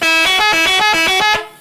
telolet

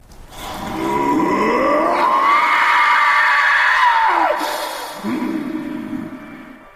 screaming

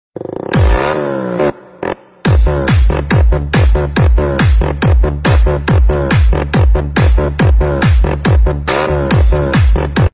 moped techno

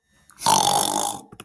boy pig snort

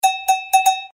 bell; quarter pounder

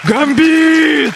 war cry

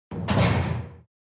prison door closing

